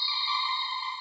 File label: return counter; wisp